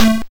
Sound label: YM Synth A; SYNTHS; CHIPSHOP DELUXE; CHIPSHOP HITS